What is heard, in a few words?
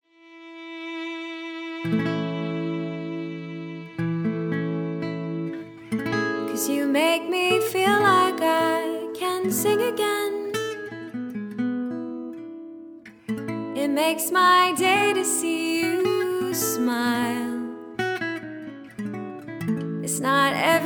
Duet